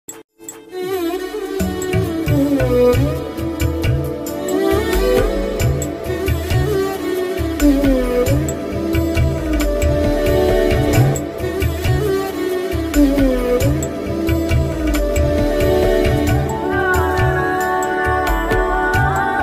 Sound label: hindi ringtone